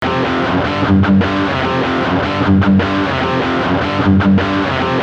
distortion

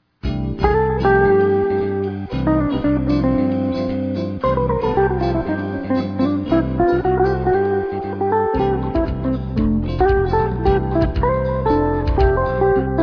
shaker

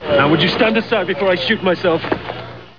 shoot

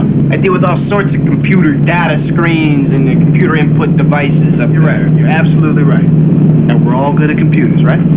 computer

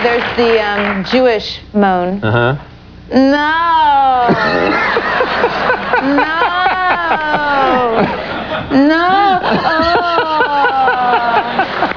moan; The Jewish moan

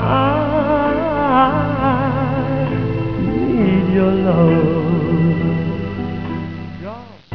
melody

